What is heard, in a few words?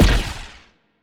mandible hit, weapons; Stellaris; biogenesis